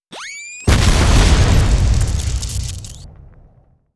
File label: emp explosion, grenades